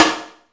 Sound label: FM DRUMS; East West; Global Sounds